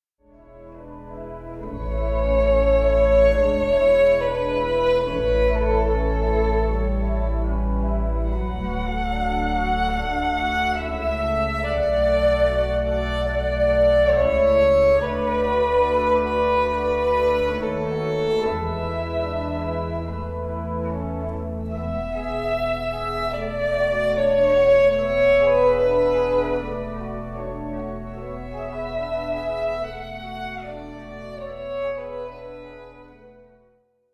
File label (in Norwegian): panis angelicus fiolin